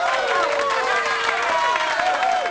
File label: crowd; Ambient; MX vs ATV Unleashed; XBOX